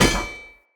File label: Shield Impact Default; Weapon Impacts; Enemy; Shields; Sinecure Shield